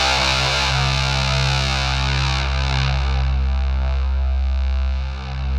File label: ZWEEP; Spectrasonic Distorted Reality 2